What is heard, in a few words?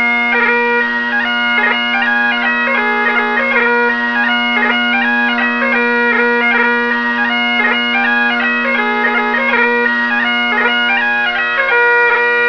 Bagpipes, Highland Ball